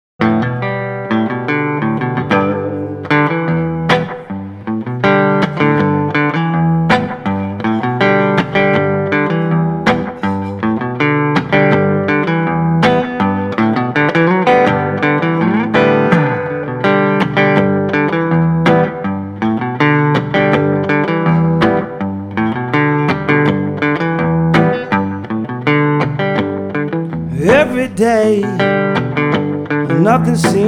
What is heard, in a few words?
live solo acoustic